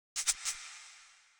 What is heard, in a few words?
Shakers; Touch Loops; CosmicFunk